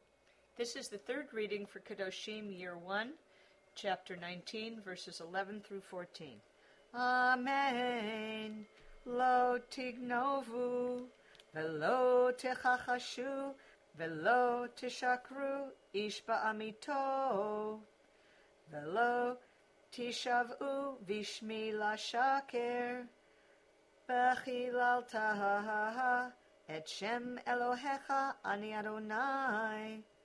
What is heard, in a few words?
Torah